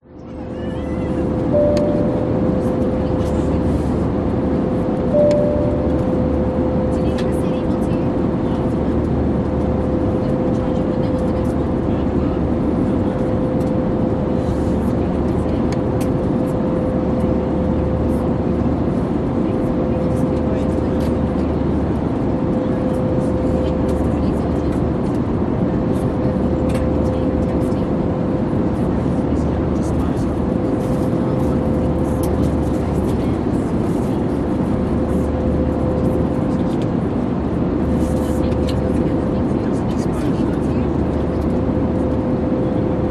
vehicles airplane airbus a320 cruising int amb people chat constant engine drone; australia